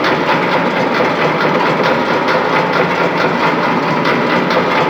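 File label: Settlement Distant Machine Loop; Warframe; Ambience; Grineer Settlement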